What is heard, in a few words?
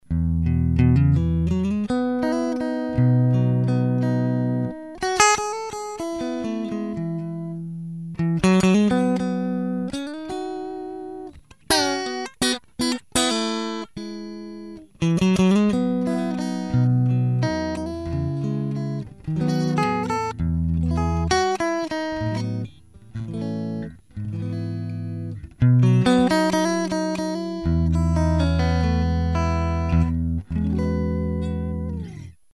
Clean Preamp